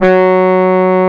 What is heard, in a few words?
G3, ANA HRN